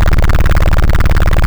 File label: helicopter loop; movement